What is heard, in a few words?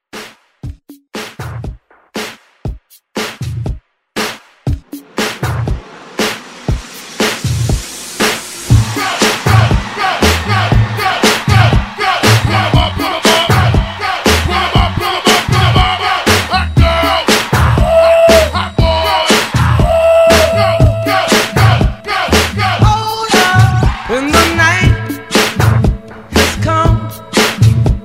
60s Hype Re drum 119